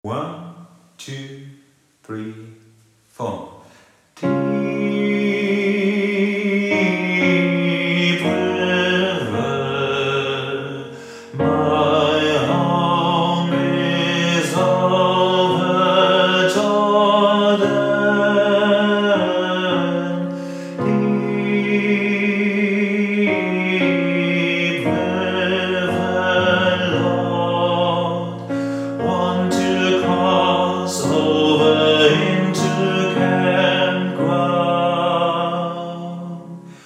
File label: Deep River Tenor; Popchor